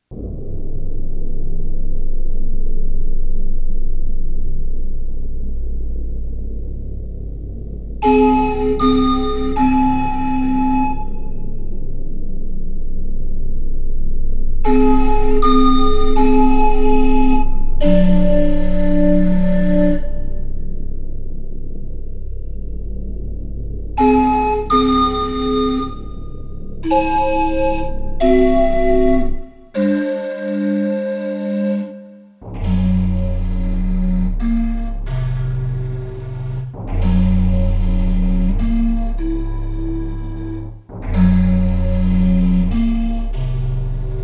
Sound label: Scale of an anklung set from Tasikmalaya as flute; tuning survey